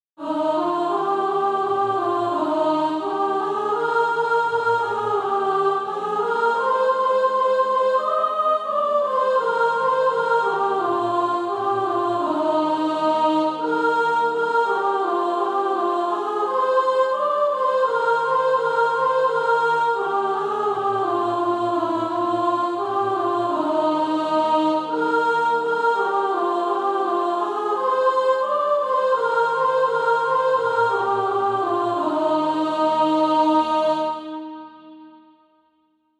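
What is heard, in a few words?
sop, Practise Music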